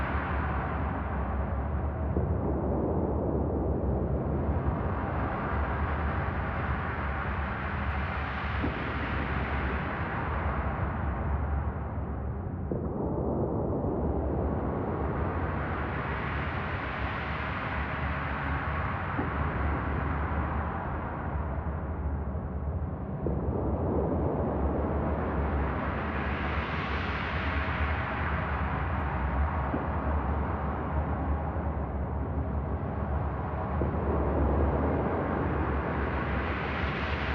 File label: dark ambience 91bpm; hot lava; ios macos windows